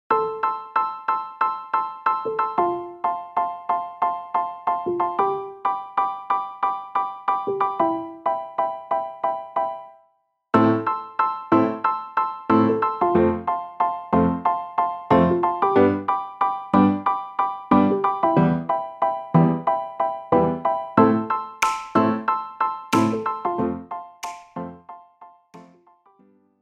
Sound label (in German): Play-Along, Sopranblockfloete; BlockPop